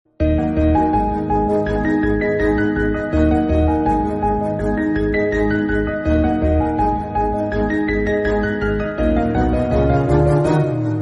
BGM Telugu Ringtone

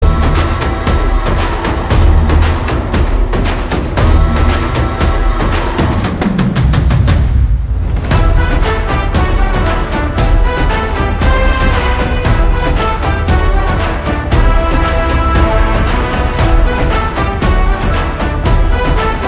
Instrumental Ringtone; KGF 2

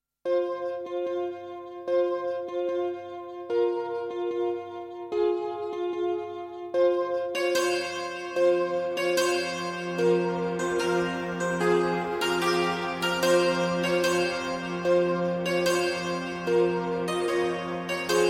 Romantic Soft Tune

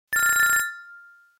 Blackberry classic

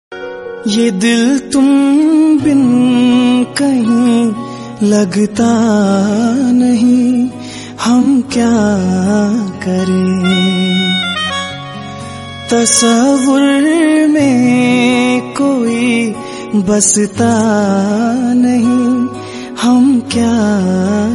Hindi song

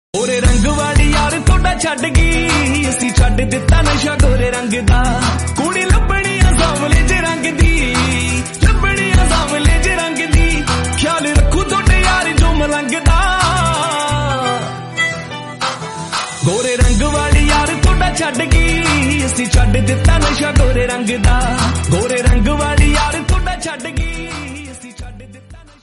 Sad Love Punjabi Song Ringtone

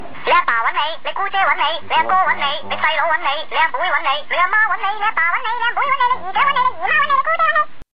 Cantonese Tone

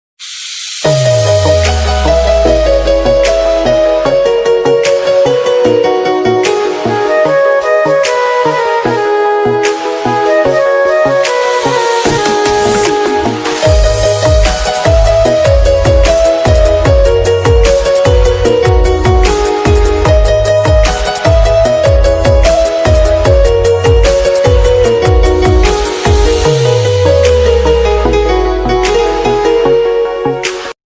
Indian army instrumental ringtone

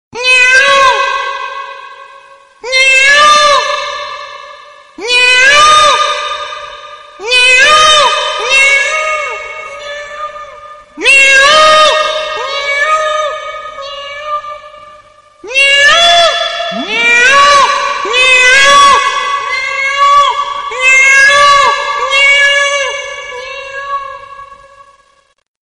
peacock sound

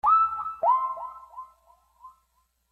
Notification

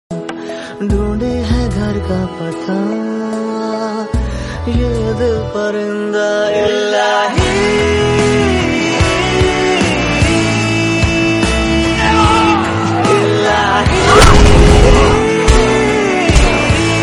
ringtone

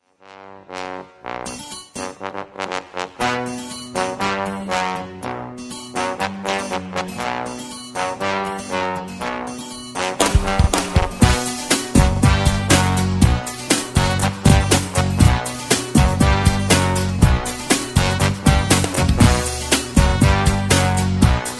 Instrumental

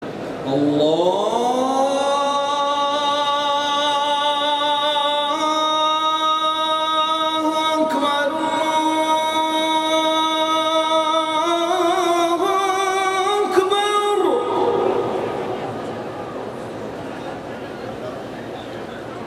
Makkah Azan ringtone aka Adhan athan

